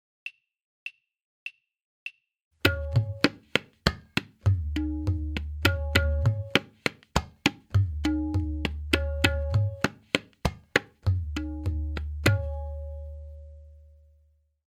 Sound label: Tihai, tabla; Bedam